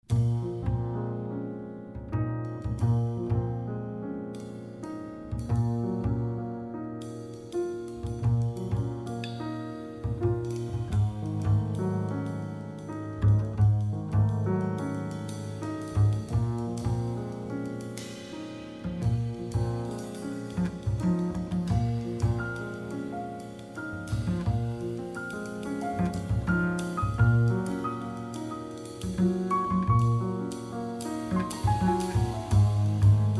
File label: piano trio